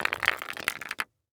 Weapon Grenade Stinger Grenade Roll On Cement Floor Short; AdobeCC